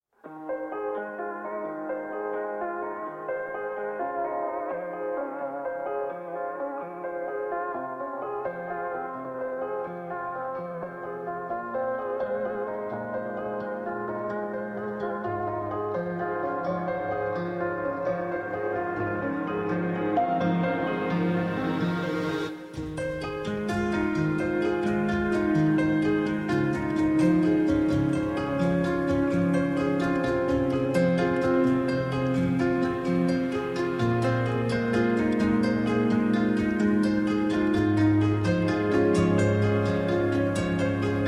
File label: Piano Reworks